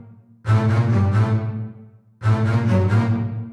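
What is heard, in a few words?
Double Basses 136-A, horrorscape samples 136bpm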